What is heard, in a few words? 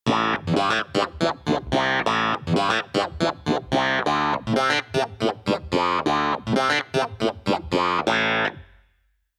keyboard clavi funk clavi